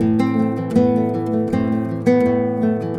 SPANISH A, GUIT, 80BPM; Best Service; World Colours

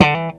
Oct Pop; Mo' Phatt; Digital Sound Factory Mo' Phatt

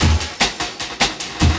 LOOP, 150 BPM, Drum & Bass

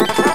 Explosion; Revolution X

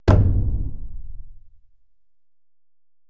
Procussion; Best Service ProSamples vol.55; Retro Sampler; EMU E-III; Procussion Mix

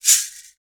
GANZA, Afro Shakers, Shake&Rattle; Roland LCDP14 Africa VOL-2

